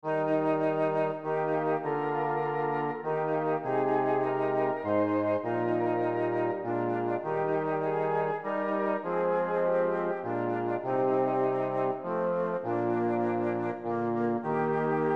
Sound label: Bass, choir; chalice choir practice files